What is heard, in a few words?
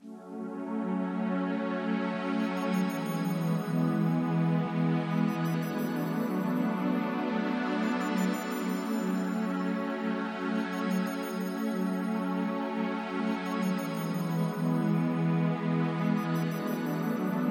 enigmatic dance 432 Hz